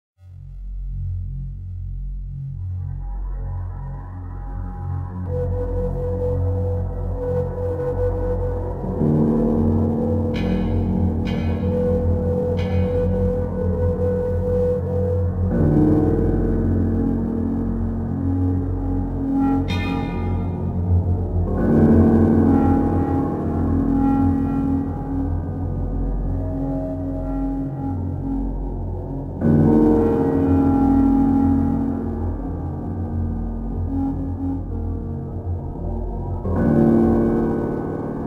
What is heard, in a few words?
BGM Suspence Forest